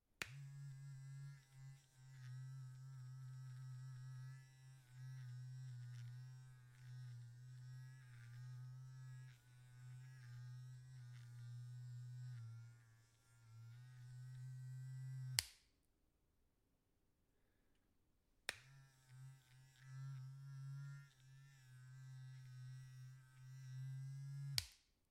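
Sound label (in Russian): звук электрической зубной щетки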